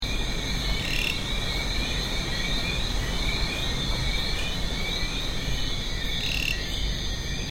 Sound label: Amphiacusta